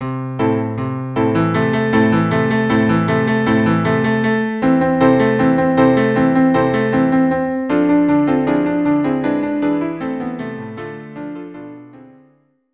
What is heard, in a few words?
cello piano; Siman Tov